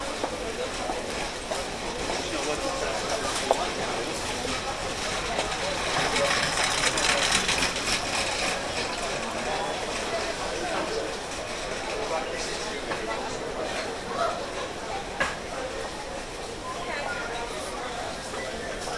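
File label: atmosphere crowd airport; best service Blue Box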